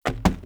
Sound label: Wood Stool Impact Light